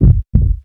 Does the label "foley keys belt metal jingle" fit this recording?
No